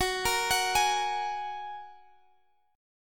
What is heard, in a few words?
F sharp Major Add 9th; F#add9 arpeggiated; x,21,20,23,21,x